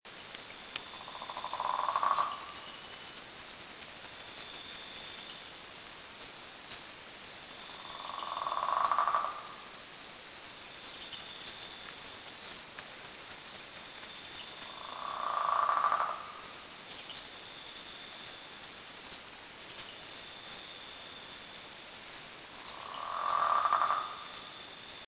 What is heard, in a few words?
osteopilus crucialis windsor jamaica; West Indian